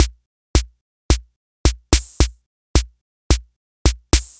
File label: Tango